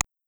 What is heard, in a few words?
warning; keera-posture